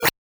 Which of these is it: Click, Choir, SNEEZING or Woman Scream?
Click